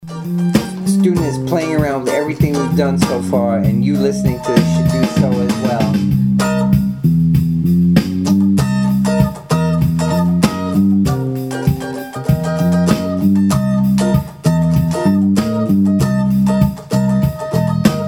funk voicings

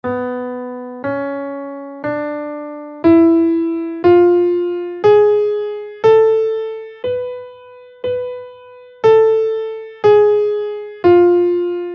dorian b5 scale in Bm, guitar

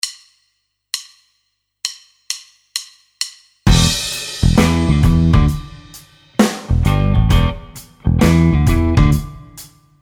blues

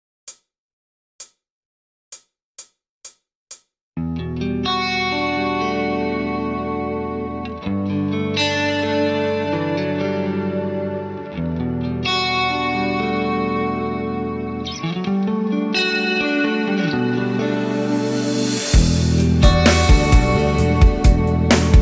arpeggio backing track; sweep picking

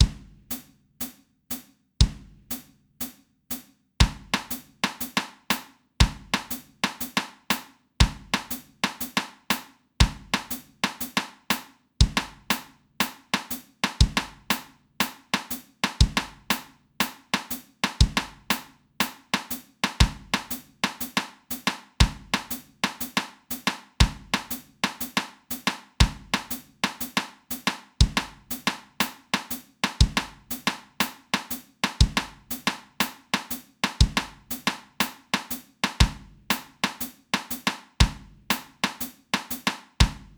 clave drum hits only; 12 claves

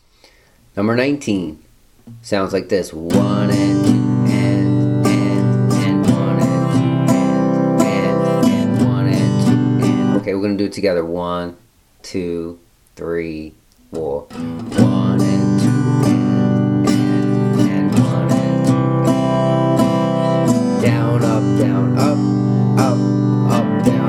strumming

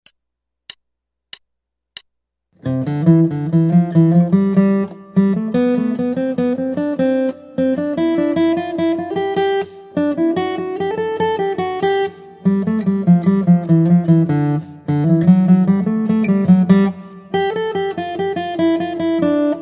Guitar

